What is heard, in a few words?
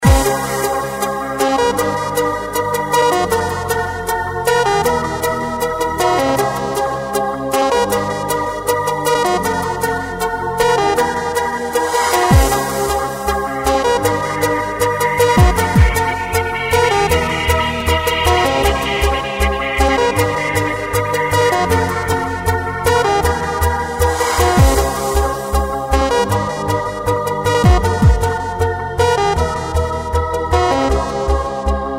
orbital tunnel trance